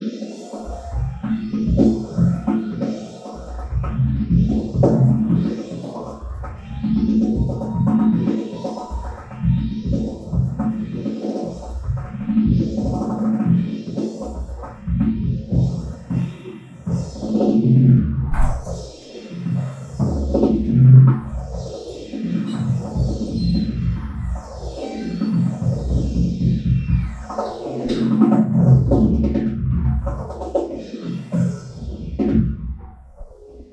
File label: Drumset